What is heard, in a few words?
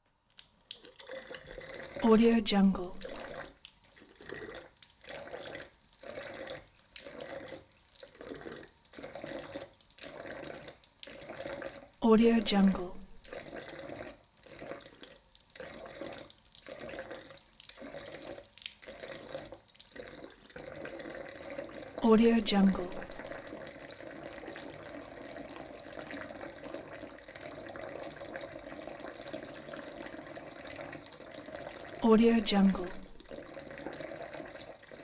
int drink from faucet